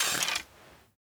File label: bow draw cancel; Rust